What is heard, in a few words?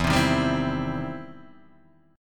E Minor Major 7th Sharp 5th, E